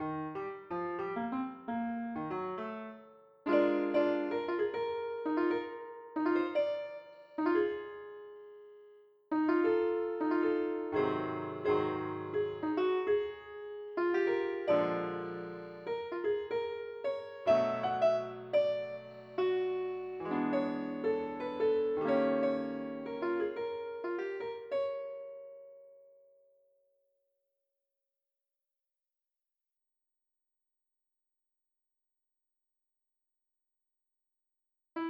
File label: piano